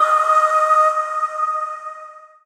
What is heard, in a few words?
Void Shawzin Oct Three D Sharp; Warframe; Zariman